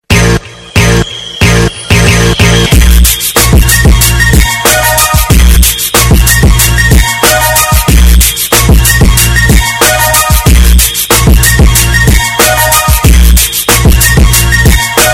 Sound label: KLUBNY BASS